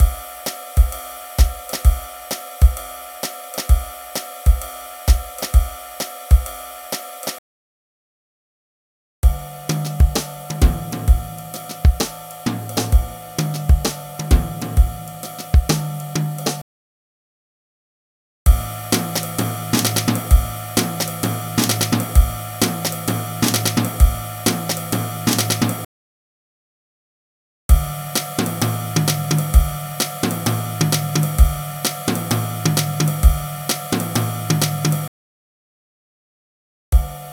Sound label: Jazz Drums 130 BPM